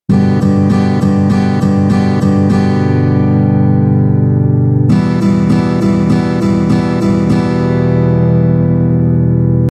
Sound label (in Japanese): 8分音符, ギター; ギター講師が教える1日で弾ける初心者用8ビート